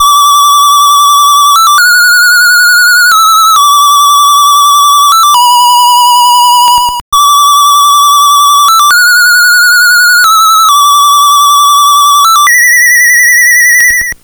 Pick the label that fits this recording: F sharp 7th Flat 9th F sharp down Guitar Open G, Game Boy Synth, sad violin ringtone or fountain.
Game Boy Synth